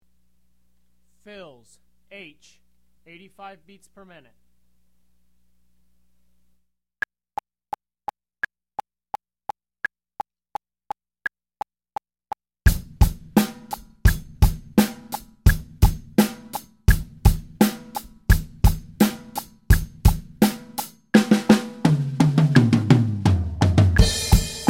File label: fills, Beginner Fills 85BPM; Drum Lessons